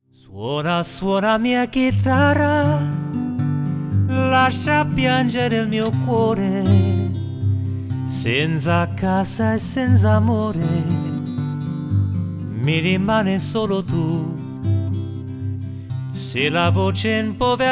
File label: Italian